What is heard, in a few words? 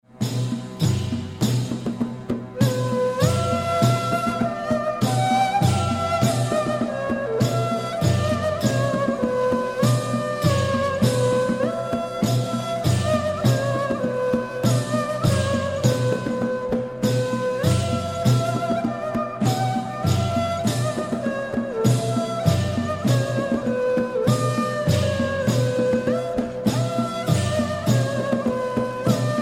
Ney